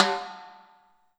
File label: High Timbales